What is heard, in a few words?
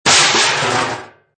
Impact Garbage Can Lid, Metal crash impact and rattle; Garbage can lid or other metal object hit, crash, fall.